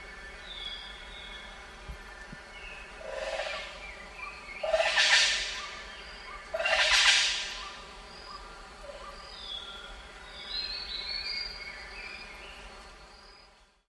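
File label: Robinson's Banded Langur, Recorded in lowland primary forest. Similar alarm calls are made by other species of Presbytis.; Presbytis robinsoni; Ulu Muda, Kedah, Peninsular Malaysia.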